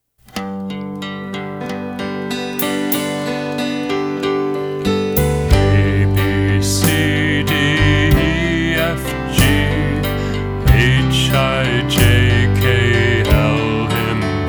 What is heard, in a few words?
Alphabet Song Tune Twinkle; lettersongs